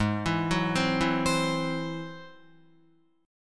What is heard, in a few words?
Ab Augmented 7th Ab down Guitar; arpeggiated; Ab+7; JGuitar